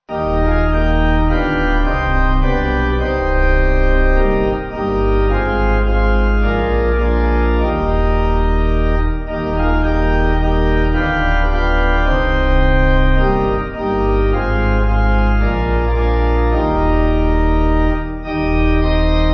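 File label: Pipe Organ, Music Backing Files for Churches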